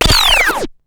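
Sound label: TURNTABLE; SoundFactory; SMPMANIA; EFFECTS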